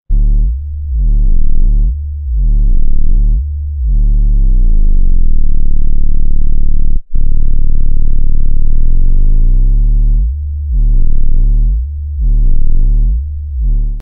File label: bass fx